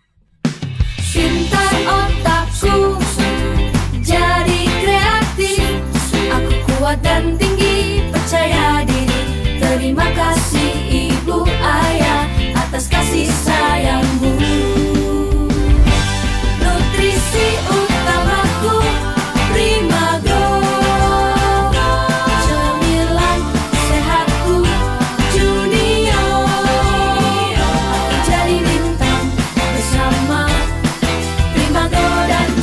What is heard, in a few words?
Jingle Dance; Competition